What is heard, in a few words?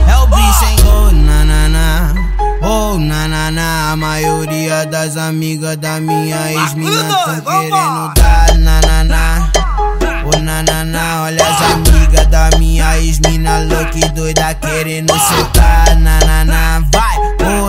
english tone ringtone